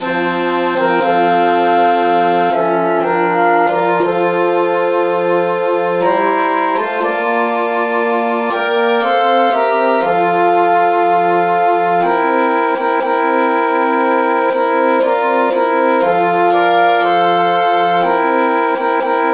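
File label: traditional key E flat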